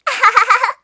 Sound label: "ahahaha"; kanjou